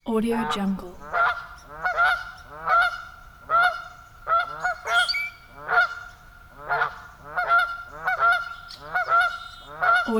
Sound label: country wetlands geese